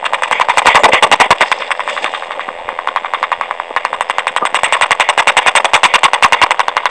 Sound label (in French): clac